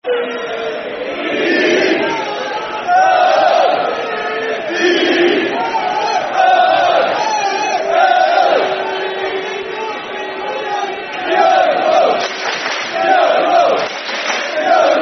Spell It Out, Football Chant; Yeovil Town Ytfc; fanchants

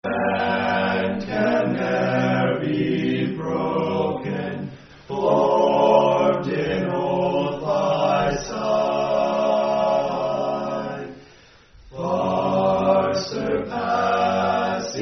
Fraternity Song; Amici; Phi Kappa Psi Fraternity Song

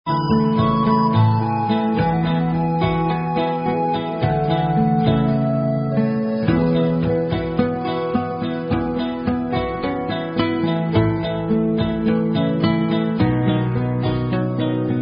Karaoke Songs; Girl Scouts

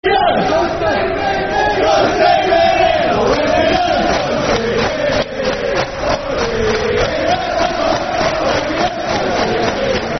Football Chant (Soccer Songs); U's Go Steaming In; Colchester United Cufc

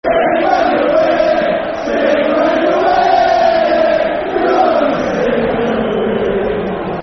Sing When Yer Winnin', Football Chant; Bristol Rovers Brfc

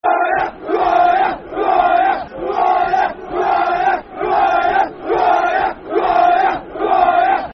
Who Are Ya Football Chant (Soccer Song); Milton Keynes Dons Mkdfc

